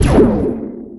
gun; EarthAttack

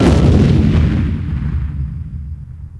explosion; EarthAttack

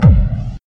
explosion mini; EarthAttack

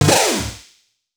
rocket; Space Shooter Alien

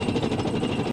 player move; Alpha-E-Corp

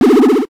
checkpoint actived; Season Land

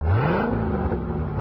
motor start up; Grave Drive

